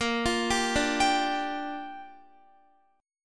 Bb 6th Bb, Guitar; Bb6 arpeggiated; x,13,15,12,14,14

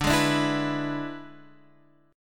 E 7th D, guitar

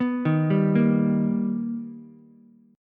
B Augmented Major 7th B Ukulele, chord; B+M7; arpeggiated; JGuitar

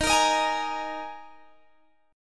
Ab Suspended 2nd chord

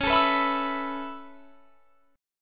Db Minor 7th Sharp 5th Db down, chord; strummed

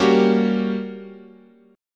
G Minor Major 7th Flat 5th, guitar chord

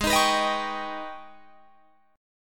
Ab Minor Major 11th down Guitar Drop D; strummed; AbmM11

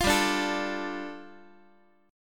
G 7th Suspended 2nd, Guitar; strummed; JGuitar

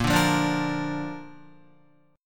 A sharp Major, Guitar; strummed; JGuitar

